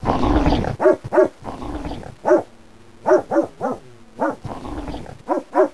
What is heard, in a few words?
hellhound